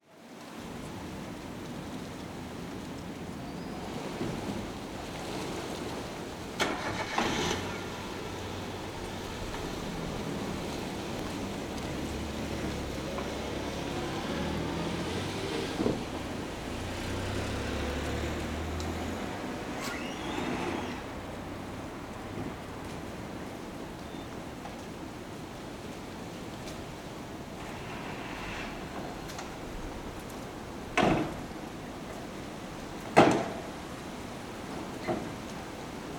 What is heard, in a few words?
ambience town outskirts residential windy autumn leaves people traffic